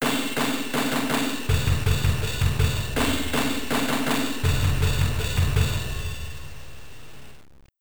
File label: Ditty; MLB Slugfest 2004